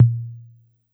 Analog Tom, Yamaha PSR-E323

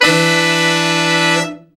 BRA SECT; BRA SECTIONS

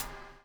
BUBL, BUBBLE; Spectrasonics - Backbeat

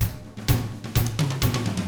127 Drums

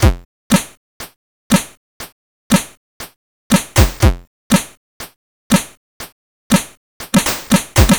C64 Beat, chiptune drums; Commodore 64

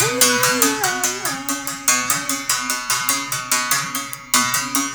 BERIM GRV, BERIMBAU; Spectrasonics; Heart of Africa 2

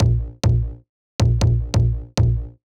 Bass Riff Bb, 138bpm; Zero-G Sound Sense Grime Scene; Construction Kits; Da Suspects

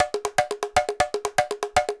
120 wood

